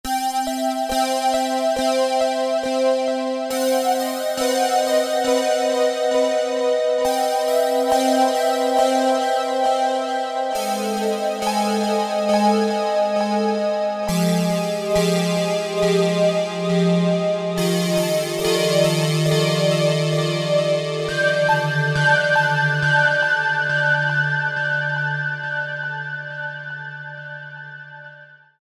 SYNTH PADS; Ethereal Sunrise; Apple GarageBand 2

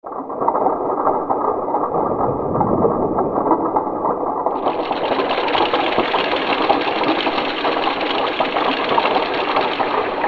RainForest, Korg MSC-10S Ethnic

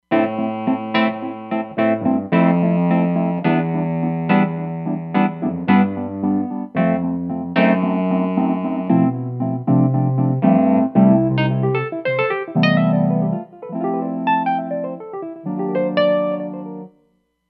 Super Wurly, Roland Fantom XR

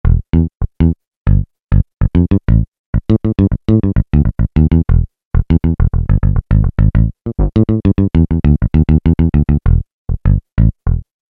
Finger Mute, Peavey DPM Spectrum Bass

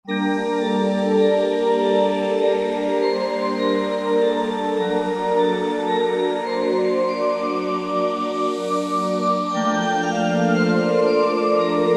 BellVox; Roland JV-2080

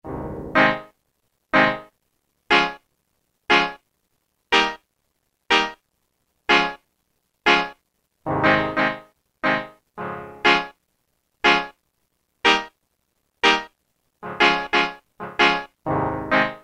Reggae Chord, Roland M-DC1

